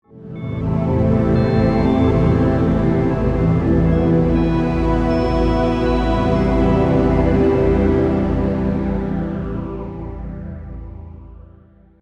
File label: A Minor Intro